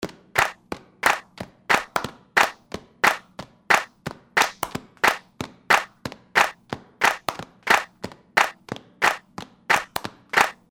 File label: perc